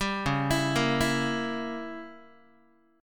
C sharp Diminished 7th C sharp down Guitar 4A 4E 5A 5D 5G sharp 6C sharp; C#dim7 arpeggiated; JGuitar